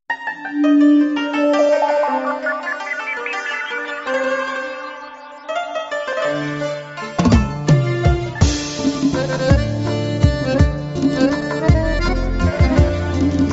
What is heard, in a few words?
Accordion